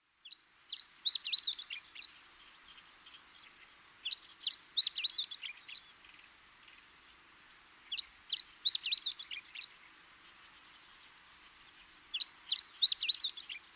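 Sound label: chestnut-eared bunting, ishikari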